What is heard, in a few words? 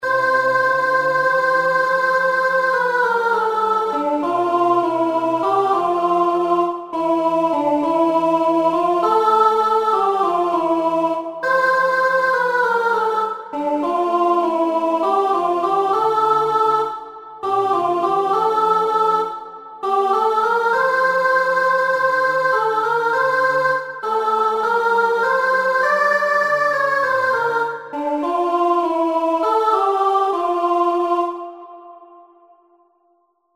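Chorus Tune Soprano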